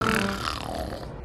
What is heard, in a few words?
Sleeping Snoring; Salman Khan; Partner Movie Meme Templates